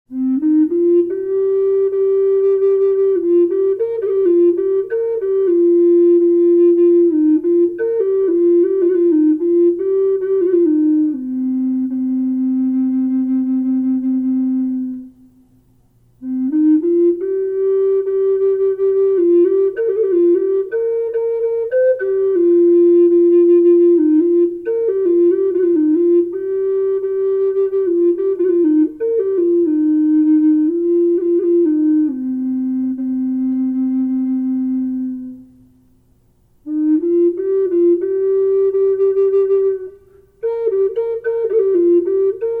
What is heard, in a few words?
low c horned reverb owl, flute